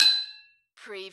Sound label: Hit Metal Pipe; lynne publishing